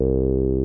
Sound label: TB303; Zero G; Man Machine